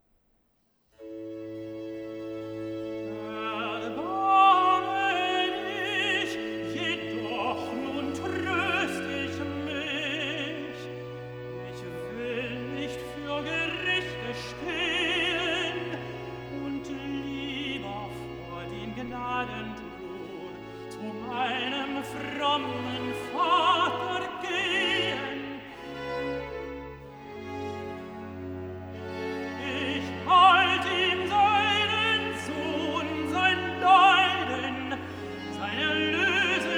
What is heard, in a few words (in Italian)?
Cantata, Recitativo (Tenor); BWV 55 'Ich armer Mensch, ich Sundenknecht'; IV. Recitativo (Tenor) 'Erbarme dich!'; Bach; Tooting; 22nd Sun After Trinity